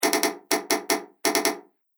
Sos Morse Code; Distress Signal (Help) Sound Effect

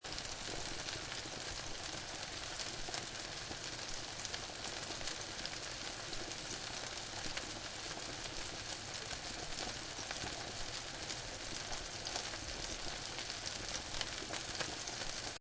Frying And Boiling Loop; Kitchen Sound Effects; Lynne Music

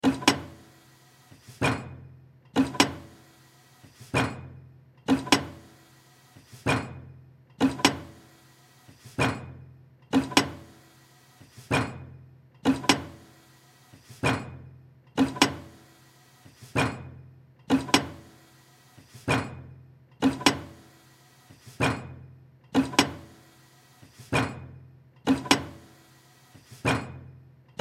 Bantam Brake Machine Metallic Pounding Loop; Machinery; Industry; Blastwave

